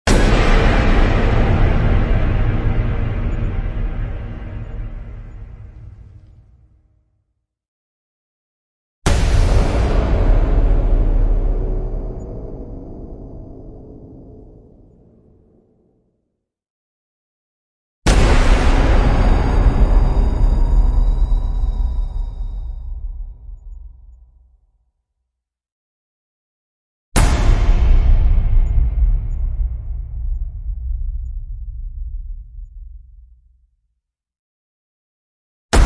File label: Impacts; Epic Tools vol. 1